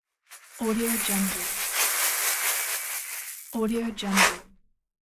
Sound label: pour cereal